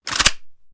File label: sm raise first charge; gmod